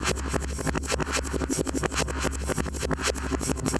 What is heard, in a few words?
Time Travel Beat, Electronic Beats; Sonic Foundry (Sony Creative Software); Myths of Technology